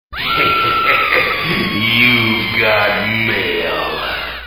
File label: evil laugh; email